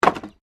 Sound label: Wood Drop; Material components for constructing more complex sounds; Materials